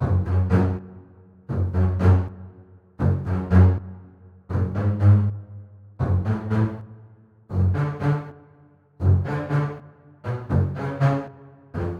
bass, playful waltz

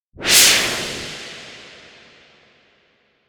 Noise C; 90’s Eurodance

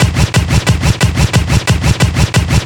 Big Scratch

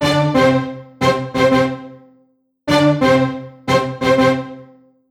Stacc, strings

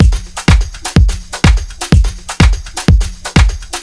Lots Of Beat, диско-хаус

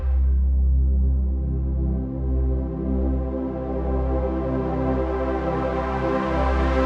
Nation Poly A, trap

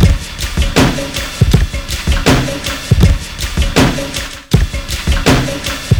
Perc Rider; Ударные и барабаны (Downbeat); 80 BPM; Downtempo; Drums; даунбит

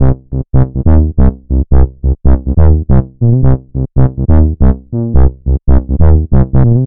Balboa, Techno Trance

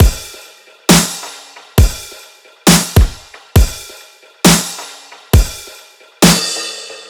Platt Kit, 135 bpm

